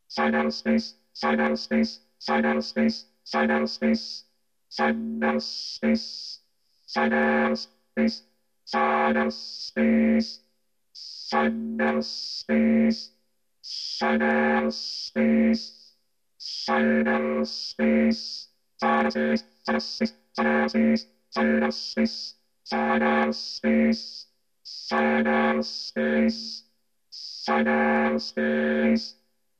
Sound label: Vocorder